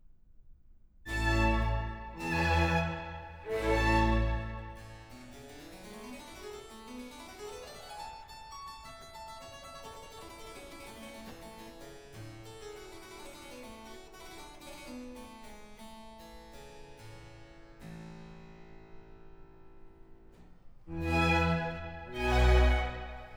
Sonata, for trumpet, strings & continuo, Adagio Largo